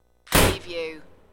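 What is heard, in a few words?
Small Metal Door Slams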